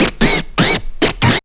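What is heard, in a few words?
SCRACH, scratch; E-Lab; X Static Goldmine 1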